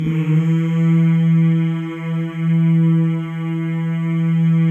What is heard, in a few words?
male; Spectrasonics; Symphony of Voices